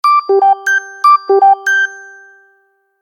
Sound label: Morph, ringtones; Samsung; Galaxy Watch 6